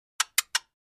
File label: Flash Gear Three Slow, flash gear